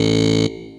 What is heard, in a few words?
short syn, synth notes; Techno Trance Essentials